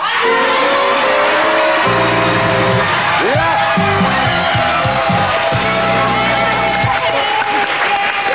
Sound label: zoom win; すべてのパネルが開いた場合。もしくは、相手に解答権が移ってから正解した場合。; ある、ある！→ある、セット勝利